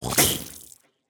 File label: Spiters